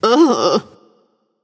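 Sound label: ugh, female; smite; voicepack; hera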